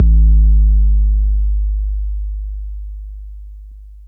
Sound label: BD 808 Noise